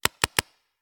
Paintball Gun; Sound Ideas; Guns

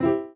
PUZZLE Success Piano Three Note Fast Soft Dry; stereo; Universal Sound FX

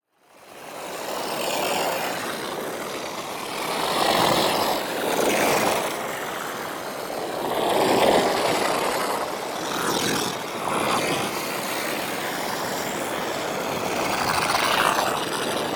PARTICLES; Gritty Space; boom library; Matter

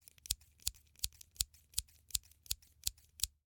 Scissors, snap, snip, shear, small med, 9 snips; Eiravaein Works; Vaeyan IV

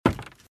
Footsteps - Wood - Creaky - Run - Right

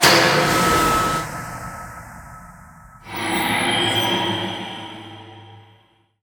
Ice Spell Healing Healing Potion; Healings; Arctic Aura

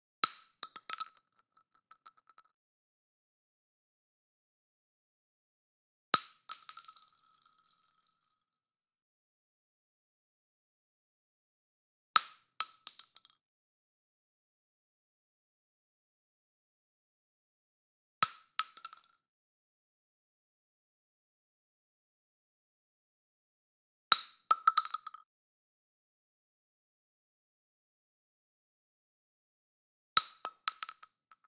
12 Gauge Shotgun Shell Drop On Concrete; Hollywood Edge; Heavily Armed

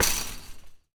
Street Ball Fence Hit; SFX Kit

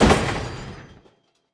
IMPACT Metal Large Rattle; stereo; IMPACTS; Universal Sound FX

